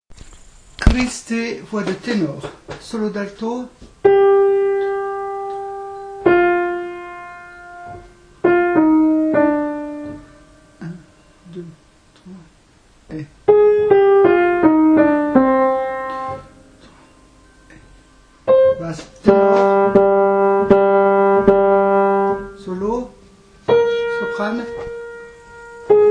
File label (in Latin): Christe, Tenor; Niccolò Jommelli, Missa pro defunctis